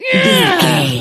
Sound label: voice female attack